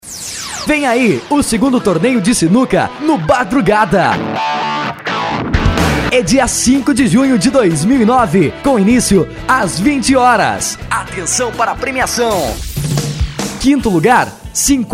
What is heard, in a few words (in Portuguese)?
sertanejo remix e electro dance